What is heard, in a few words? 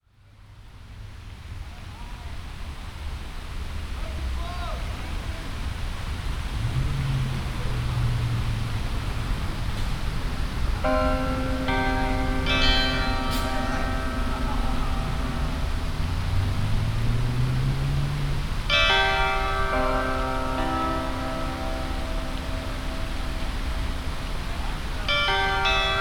Splash Park